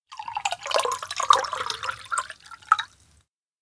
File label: cookoff smoothie pour; koyomi; anemoi